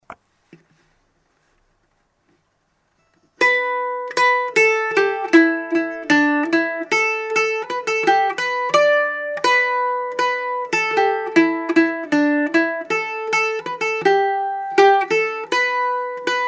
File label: Polka; Pete Bradleys